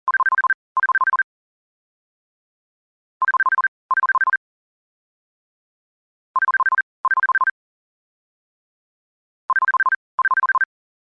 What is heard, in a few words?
Technology, Cell Phone Ring Tone; Rings; Electronics